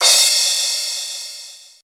crash cymbal one shot f key, Royality free crash cymbal sound tuned to the F note.